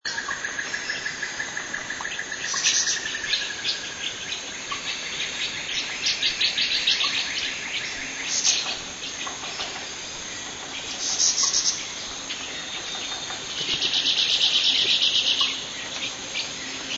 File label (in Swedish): lambir natur; borneo